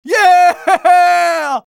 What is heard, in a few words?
scout triple jump; vo